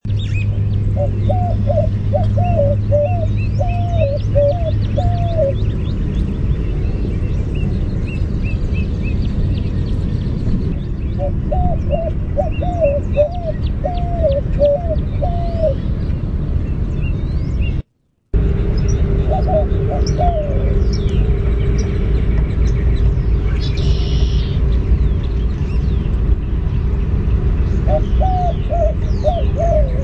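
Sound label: White-winged Dove Advertising Coo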